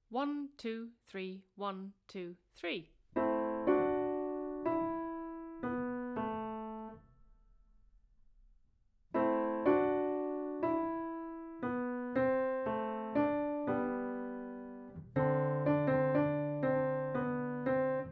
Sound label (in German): Lied der Mutter Playalong